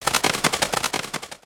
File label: wing flap loop long loud; creatures organic